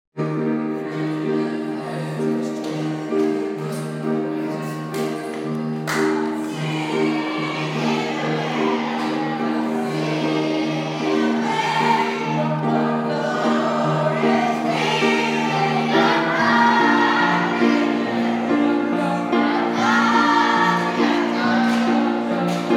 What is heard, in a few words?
choir